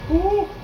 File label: jooooh